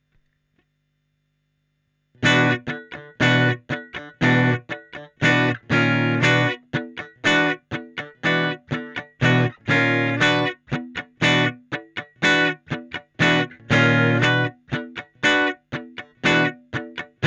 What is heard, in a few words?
Amp Room Fender Clean; Softube Amp Room Bundle